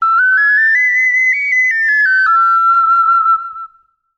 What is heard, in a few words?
Ocarina; Kaeru; Legend of Zelda The Link's Awakening